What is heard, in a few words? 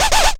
Old Zap; Zero-G Jungle Warfare 1, 2 & 3